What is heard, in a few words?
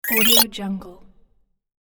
game reveal button